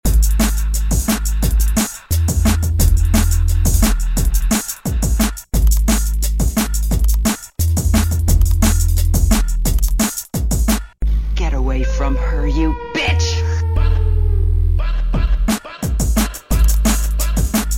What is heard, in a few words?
Drum and Bass; Beatlab